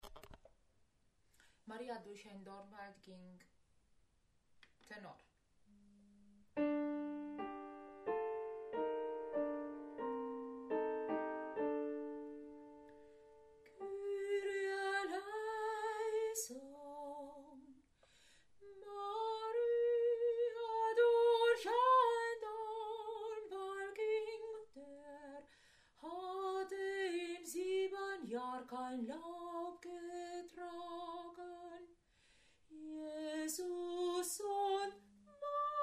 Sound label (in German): Maria durch ein Dornwald ging Tenor